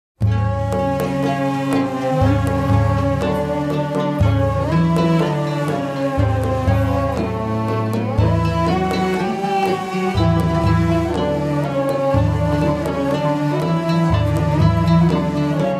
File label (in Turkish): segah pesrev neyzen; yusuf pasa